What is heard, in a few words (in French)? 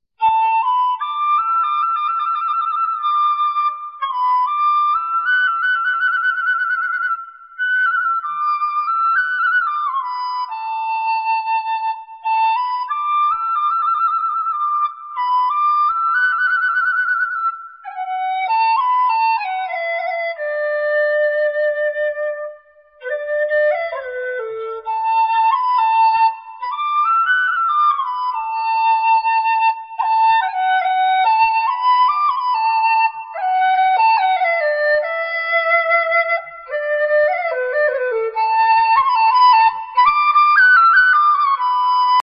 sonnerie zen